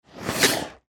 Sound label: skateboard